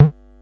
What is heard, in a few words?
silly elec fx